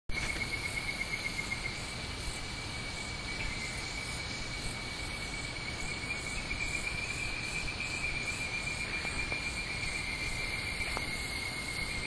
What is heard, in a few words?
Hyla avivoca